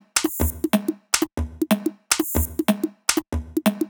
Top D# 123bpm, House Drumloops; Locust; Zero-G House Fabrik Drumloops

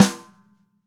Ludwig Black Beauty Snare F; Sonic Foundry; Sony Creative Software; Vital Drums; 1969; Snare One-Shots

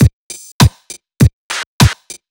FK BEAT, FUNK; USB Soundscan vol.47; AKAI; Hip-Hop & R&B

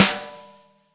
snare LATIN, Roland; Roland L-CD701; Snares 4; Sn Modules 4

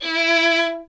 STR VLN, Vln Detache; Miroslav Vitous

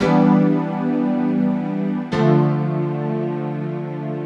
EP, Keyboards, Electric Pianos; Sonic Foundry (Sony Creative Software); Mac Money

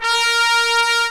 POP BRASS

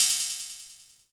Hats, Elektron MachineDrum SPS1 MKII

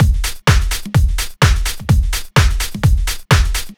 Drum Loops, 127 BPM; Sonic Foundry (Sony Creative Software); Underground UK House & Electro

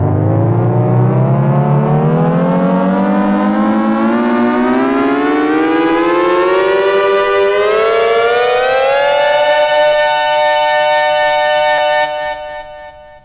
FX; AMG Megabass REMIX! VOL-2

